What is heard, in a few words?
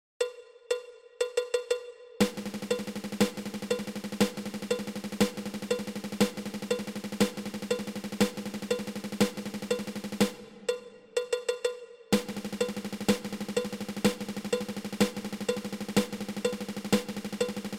Eleven Stroke Roll